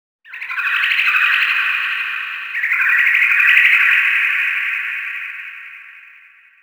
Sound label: environment creature chirp